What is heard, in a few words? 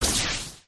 dryfire; melodie